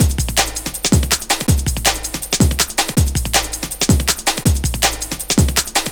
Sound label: Loops, breaks; M8